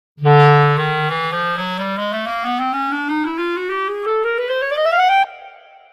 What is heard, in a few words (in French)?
clarinette soprano